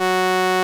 SOLINA, String Machines

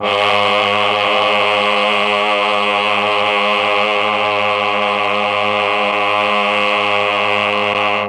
CHOR; ILIO; Propeller Island Legendary M400

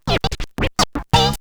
SCRATCHES; Scratch 1

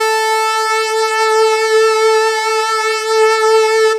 Lead Synth (single note)

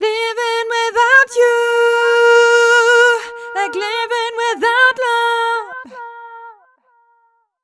f mel c, fem Vocals

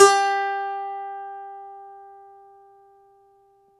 DOMRA

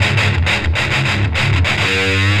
Riff, Industrial, Nasty

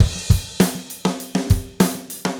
Gretsch groove 90 BPM, 1967 Gretsch Kit drums

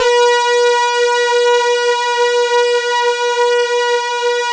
SYNC DEEP, PADS; Masterbits; Vintage Voltage

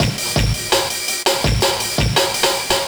Beats, Synchro Funk; Junk; Sonic Foundry (Sony Creative Software)

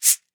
Cabasa; Roland SPD-S

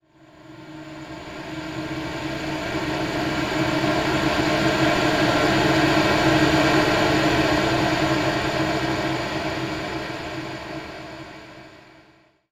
Gaseous, Noisy; Zero-G Seismic Frequencies

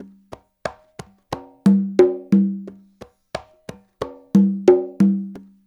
LOOP, Percussion, BOLERO; InVision Interactive; Percussion Slam

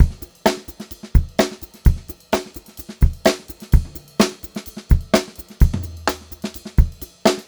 128 GR VAR, Funk Groove Drumloops; USB Soundscan vol.38; AKAI; 90 s Sample CDs; 128 GROOVY

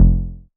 bass F1, Roland MKS-80

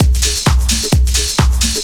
groove, DRUMLOOPS

